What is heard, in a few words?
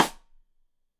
Side Stick Stereo; Deeply Sampled Drums